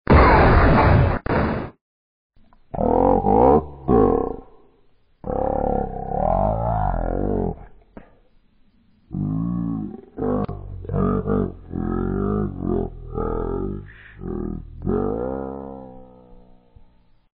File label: gun; vapesquared